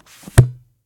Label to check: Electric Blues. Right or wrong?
Wrong